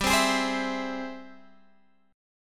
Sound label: Ab Augmented Major 7th G, guitar